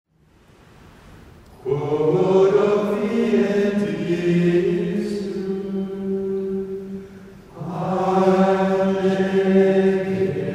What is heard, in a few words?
Benedictus Antiphon of Lauds; Quomodo fiet istud